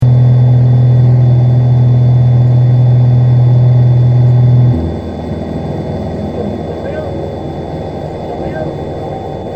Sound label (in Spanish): avioneta